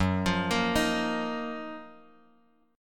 F sharp Major 13th Bass Standard; arpeggiated; JGuitar

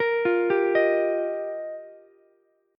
F 7th Suspended 2nd Suspended 4th, down, Ukulele; F7sus2sus4; arpeggiated; JGuitar

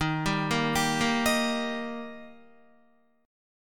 Eb Major, down, Guitar; arpeggiated; 4F,4A,5C,5G,5C,6E

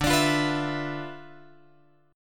D Minor 6th Add 9th D

